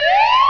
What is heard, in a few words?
siren